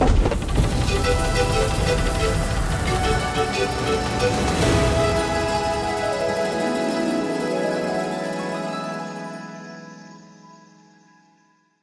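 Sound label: free Fall Gate Sound; netent; eldorado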